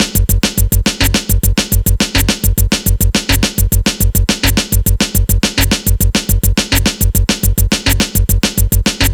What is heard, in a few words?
Sweet Ragga, Breaks, 105BPM